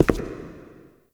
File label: Shadow Figure Steps; Warframe; The New War; Characters; Zariman Shadow